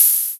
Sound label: Cymbals HH Open; Vengeance Samples